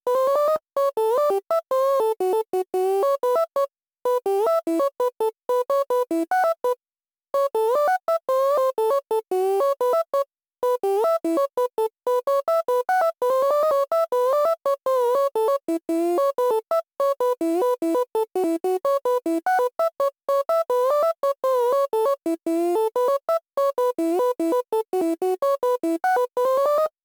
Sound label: Melody; chippy